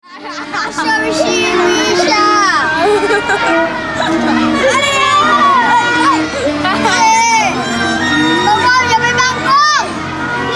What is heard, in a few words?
Recess Cacaphony, Northeast Thailand